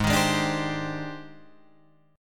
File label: G sharp Major Sharp 11th, Guitar Drop D